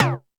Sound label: Toms 1, drum machine